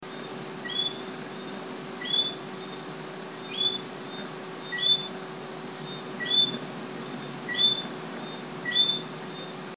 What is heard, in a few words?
Evening Sounds; StGeorges